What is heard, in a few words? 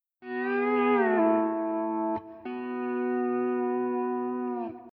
waves